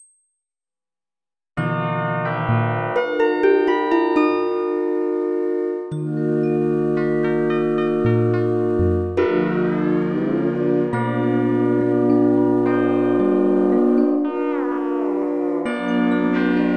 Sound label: drum